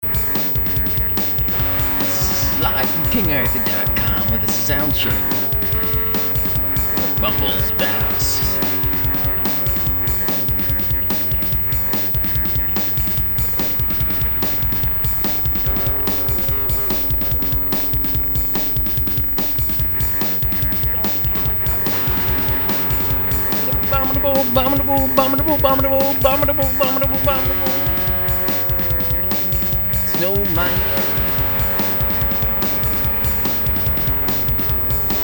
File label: Soundcheck; Bumbles Bounce